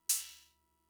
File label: Cymbal, Fricke MFB-522, Drum Machines